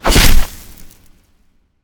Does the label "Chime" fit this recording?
No